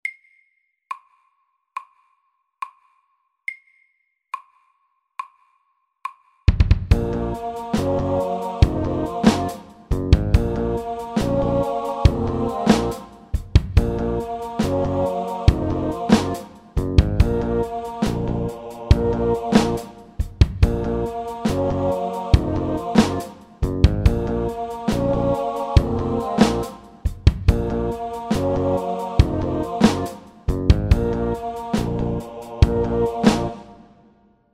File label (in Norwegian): Octave rap SAKTE